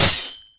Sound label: Sword Attack